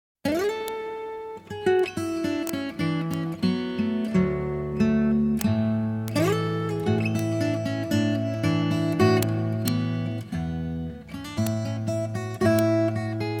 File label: Carolan's Receipt, Celtic Fingerstyle Guitar; Mel Bay's Complete Celtic Fingerstyle Guitar